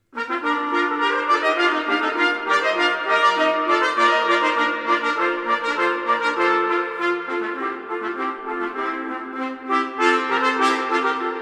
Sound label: Heroic March, trumpet; No 5 – La Vaillance